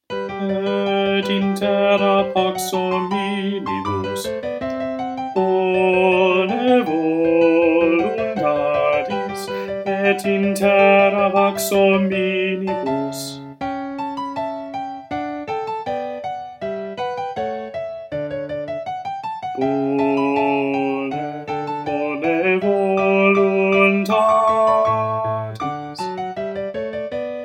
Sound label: Bass